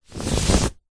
household bedsheet movement fast single rub hand flatten